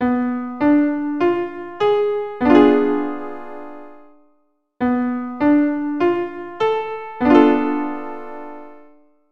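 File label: B Diminished 7th Chords plus, keyboard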